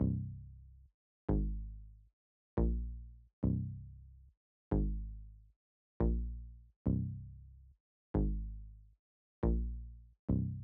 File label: Happy Melody Loop - 140 BPM C Min Bass; Melodies; Loop Stems; Cymatics; Oracle Sample Pack